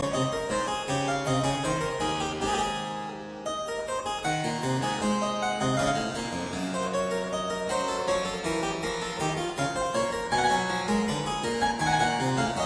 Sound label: French Suite No 4 in E flat major BWV 815 IV Gavotte